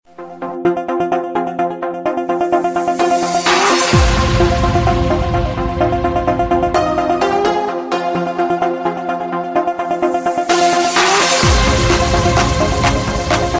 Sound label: apple tone